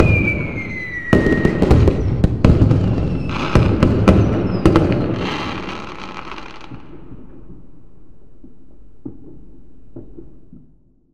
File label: fireworks